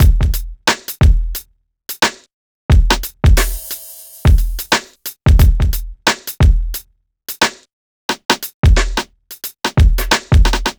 Hip-Hop Drumloop

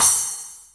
Splash, Cymbals; Drums & Percussion; One Shots Drums

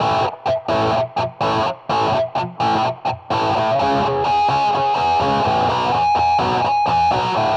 Distorted Guitar; Stringed Instruments

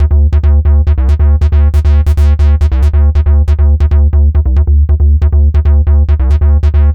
Synth Bass

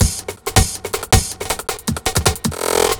Breakbeat, Drums & Percussion

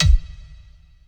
Kick, Drums & Percussion One Shots Drums

